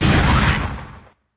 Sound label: badnics Destroyed